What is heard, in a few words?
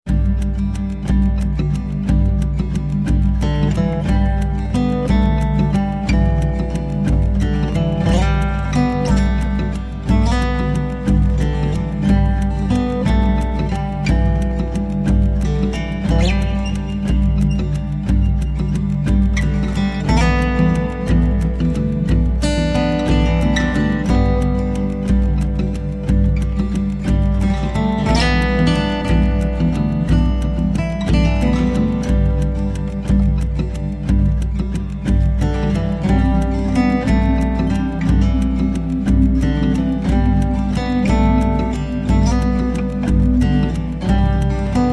Relaxing Akku; nordamerika